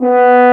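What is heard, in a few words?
French Horn mf B1; Digital Sound Factory Virtuoso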